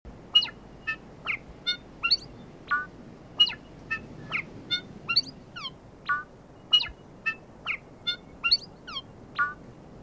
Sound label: bellbird repetitive call; WRC 2019